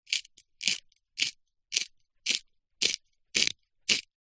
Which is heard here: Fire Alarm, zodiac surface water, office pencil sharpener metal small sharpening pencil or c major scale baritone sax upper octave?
office pencil sharpener metal small sharpening pencil